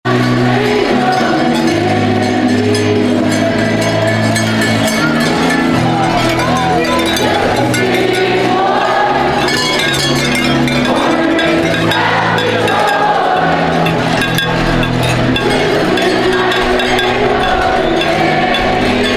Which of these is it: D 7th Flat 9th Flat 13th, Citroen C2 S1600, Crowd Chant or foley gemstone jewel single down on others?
Crowd Chant